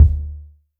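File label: vermona drm1 mk3 bass drum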